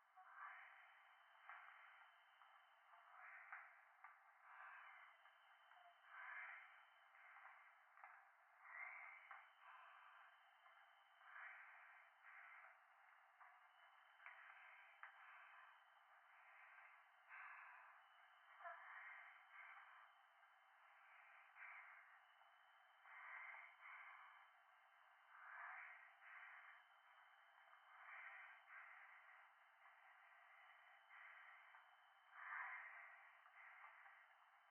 風呂 右耳密着吐息 山奥

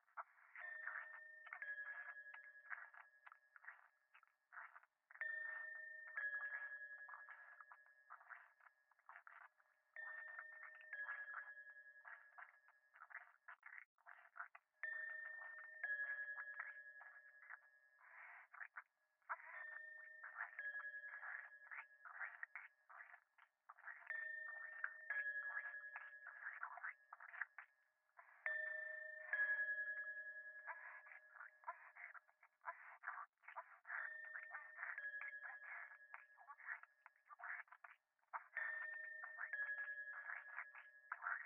フェラチオ 神社

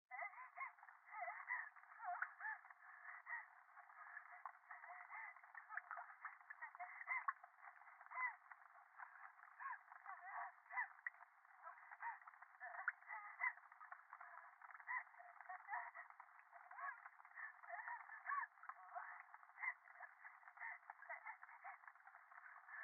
電話 喘ぎ 雨上がり

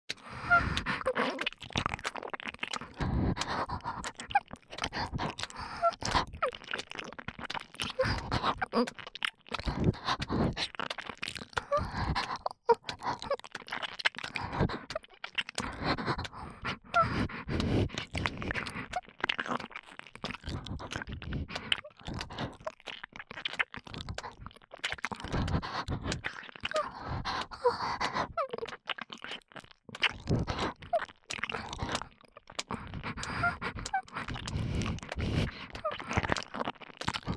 (右) 激しい耳舐めエッチ, ASMR; 白耳; パンパン系効果音版

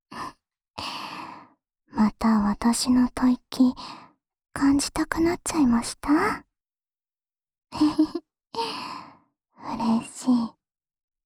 安眠用お耳ふーふー 左, ASMR

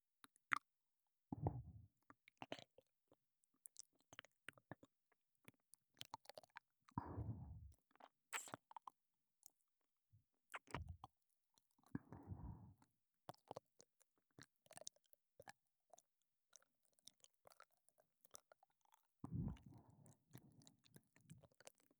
耳舐め, ASMR; SEあり

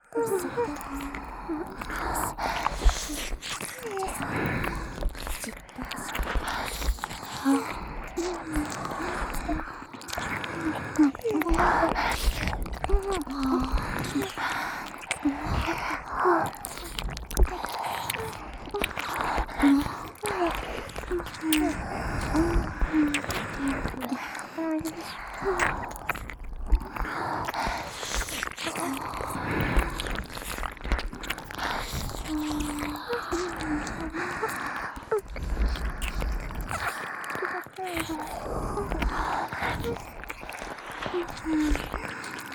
耳舐め満喫

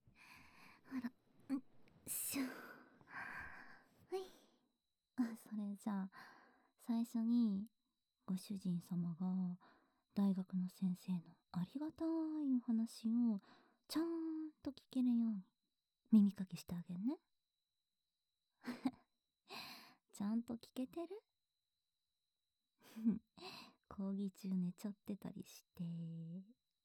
耳かき, ASMR; 夕菜のたっぷり快感耳かき

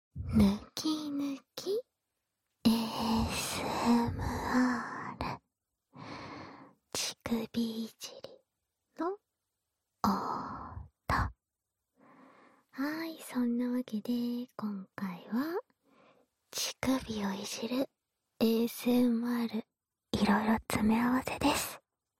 乳首歯ブラシ責めASMR

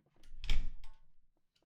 扉開ける

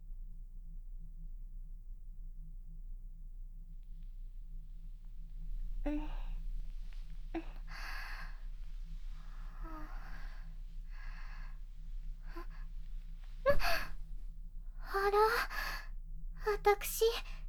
まったり耳掃除; KU100; 囚われふわふわお姫様

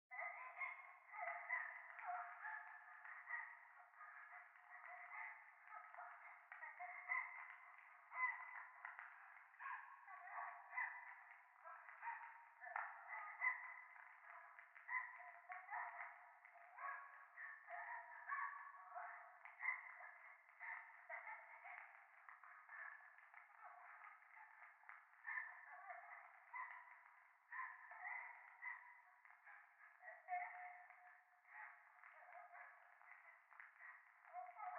教会 喘ぎ 暖炉

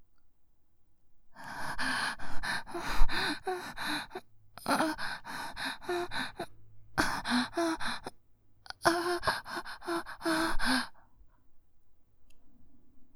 喘ぎ声(ハアハア)

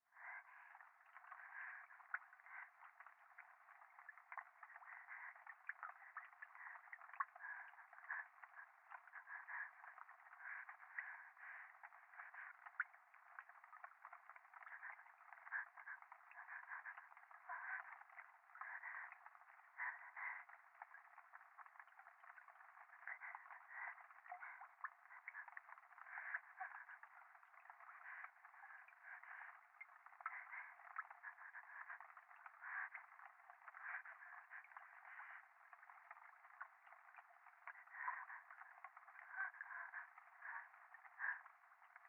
右耳快感吐息 雨上がり

